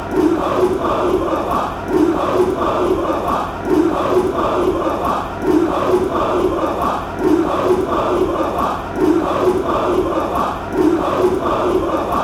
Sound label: den haag chant